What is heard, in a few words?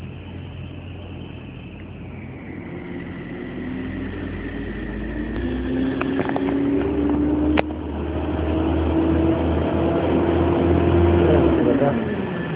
outside, bus; kevsters-sound-recordings